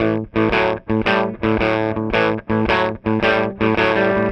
Guitars; ACID MUSIC

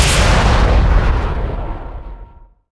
warp exit; Earth and Beyond; EA Games

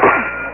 clang; gladstab

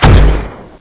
launch

